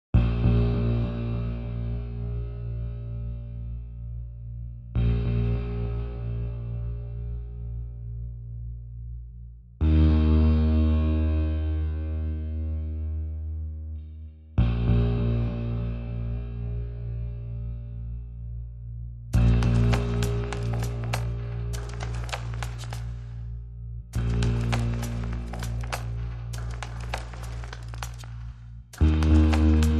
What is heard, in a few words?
Drums and Bass